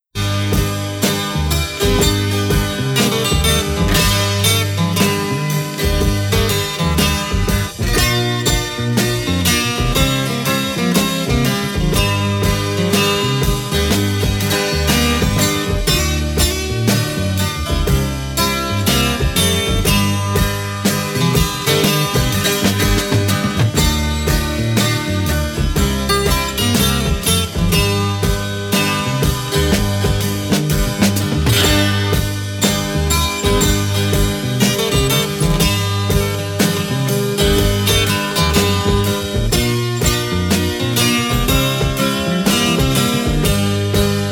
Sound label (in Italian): Cavallo E Chitarra